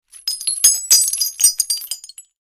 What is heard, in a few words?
Various Shell Ejects